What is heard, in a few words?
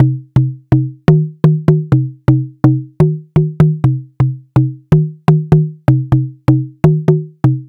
FM Drum; minimal